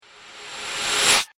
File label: A Perfect Whoosh; sound design